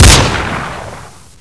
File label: elite fire, Gun Sound Effects; HeroEditor4D